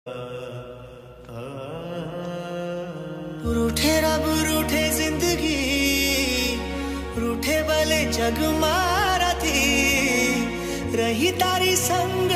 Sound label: sad gujarati ringtone